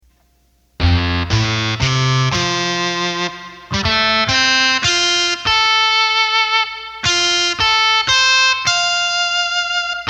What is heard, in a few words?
Brass, accordion